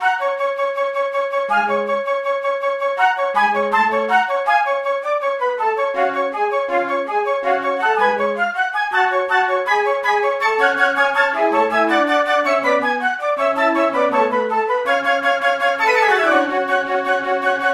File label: Minstrel Shield Flute; gamerip; the bards tale remastered trilogy